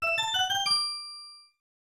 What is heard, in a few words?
Save Jingle; Albert Odyssey 2